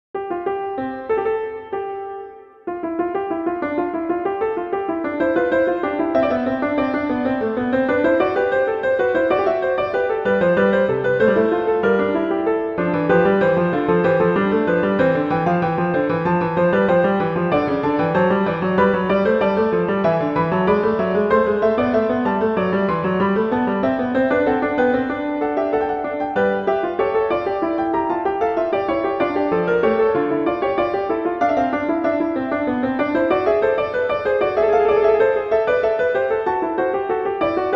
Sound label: Fugue; Bach; Prelude Fugue I